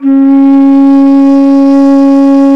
BASS BANS, Bansuris; Winds; E-MU Producer Series Vol. 7 – Old World Instruments

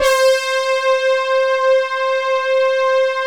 OBERH, PADS; Vintage Voltage

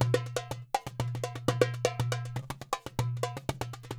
NYC Percussionworks loops 120; Sampleheads

